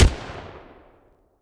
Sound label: hand Cannon; WEAPONS; Captain